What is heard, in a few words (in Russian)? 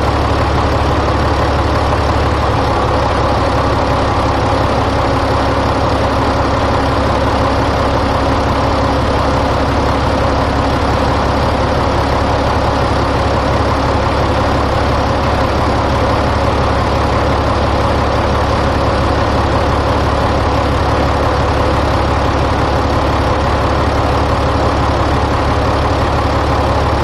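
трактор холостые обороты loop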